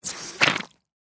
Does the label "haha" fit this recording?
No